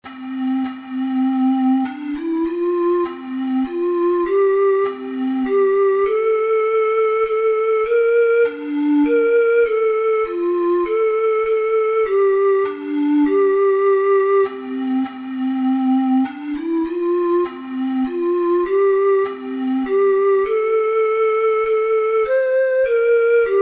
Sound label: god glory, ocarina four hole